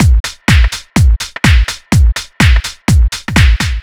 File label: 125 No Loop, Drum Loops 125bpm; Zero-G; Soundclash Nu Dance Electro; Acidized Wavs; Tough Jam